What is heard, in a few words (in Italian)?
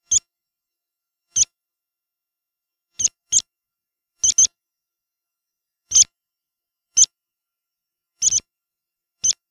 pispola, birdsound